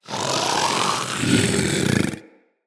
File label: Vocal Breathe Slow; Characters; Hunting Critters; Vulpaphyla